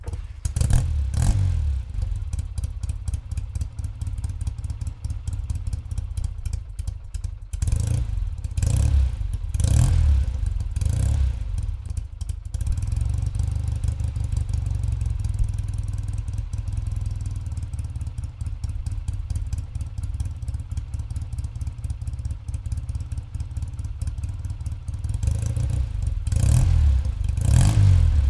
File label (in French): Triumph Bonneville démarr et ralentie